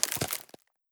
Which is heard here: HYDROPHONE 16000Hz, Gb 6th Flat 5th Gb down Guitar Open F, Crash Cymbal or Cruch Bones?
Cruch Bones